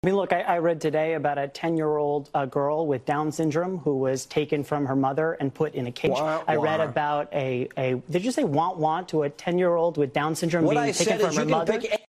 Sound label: womp womp; meme sound effect